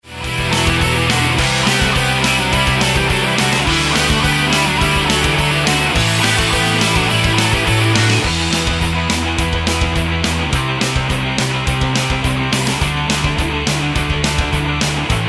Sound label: metal